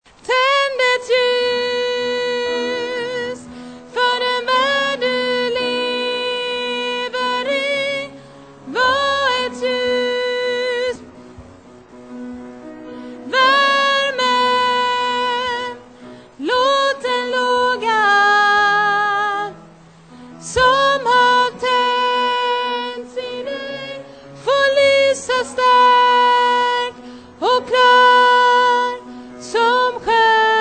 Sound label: tenor